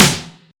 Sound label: Snares, Real